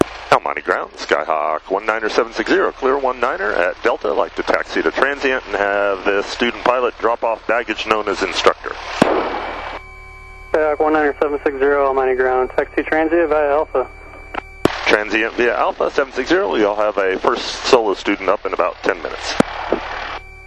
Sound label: solo taxi; First solo flight